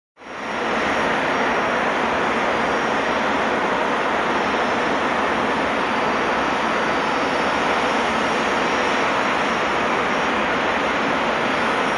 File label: Airplane Engine Propeller Up-close